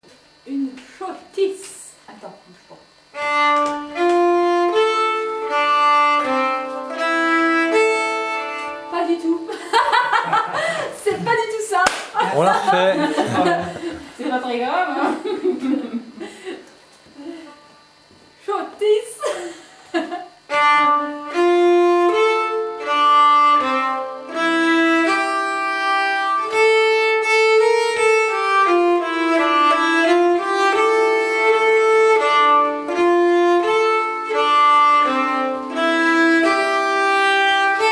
Scottish, nyckelharpa

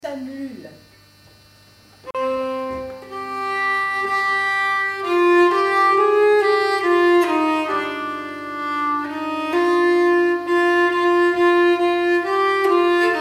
nyckelharpa; byssan

